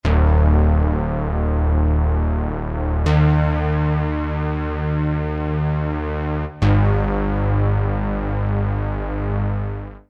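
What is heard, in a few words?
jupiter8 filter keyboard track; Bass lead demo; ROLAND; 1980-1985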